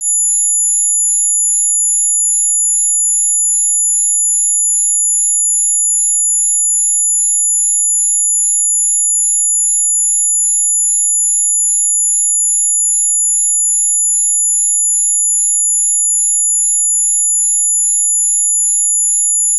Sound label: Difference Tone; Rohde & Schwarz; UPA; Rohde & Schwarz UPA-CD